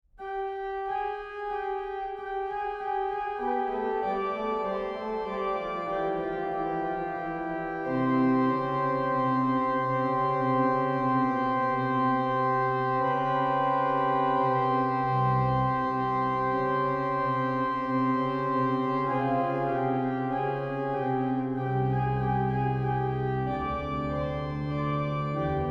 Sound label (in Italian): intonazione imitazione; Intonazioni per Canti dell'imitazione di Cristo (2016); 1) Intonazione sopra Amans volat